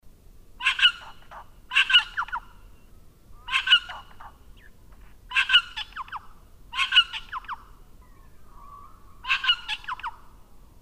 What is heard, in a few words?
Red Wattlebird